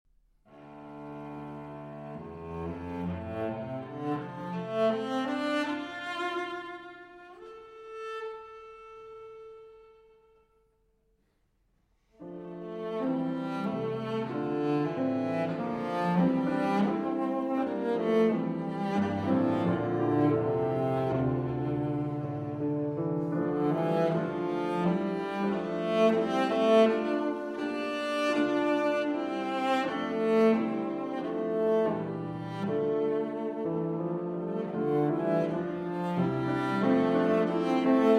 Studies in English Folksong (Version For Cello & Piano) Larghetto; No. 3; Van Dieman's Land